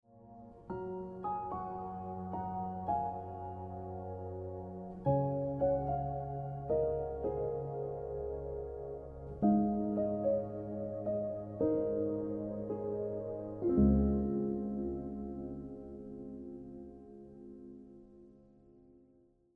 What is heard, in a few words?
Celestial Ringtone